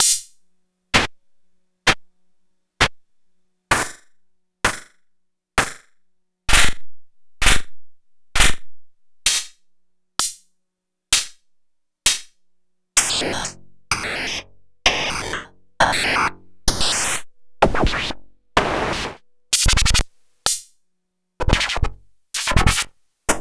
Hats High

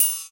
Perc Toys

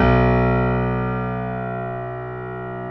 piano stereo B 0; AKAI S6000 CD-ROM - Volume 1; STEREO G-PF 2

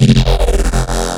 Wobble F, bass

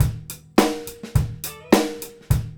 GROOVE; E-MU Producer Series Vol. 8 – Platinum Phatt

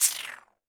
Roland TD-20 Percussion

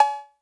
COWBELL, TR-808; Hollywood Edge; The Big Bad Beatmachine

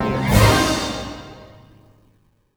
STAB; USB Soundscan vol.34; AKAI

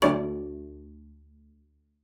CELLO FINGER D2; 90 s Sample CDs; Xsample VOL-5

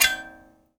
Finger Hit, Prepared Kit; Battery 3 Sample Kits

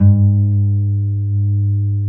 A NYLON, Guitars

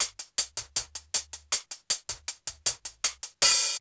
CHILL LP+HAT; AMG Gota Yashiki Groove Activator

